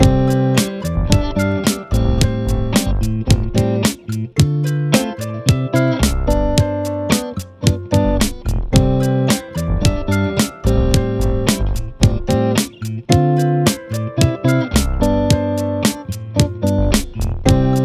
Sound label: 110 BPM